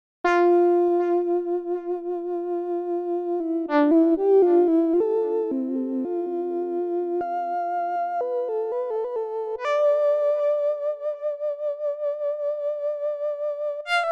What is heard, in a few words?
Analog Lab